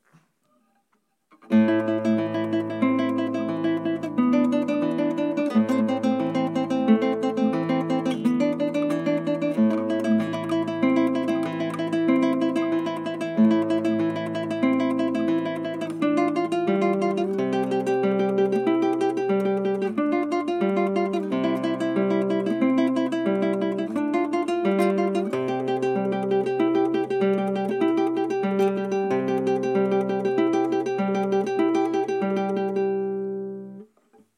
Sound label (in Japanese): アルハンブラの思い出 練習